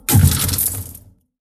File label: Single Cracking Ice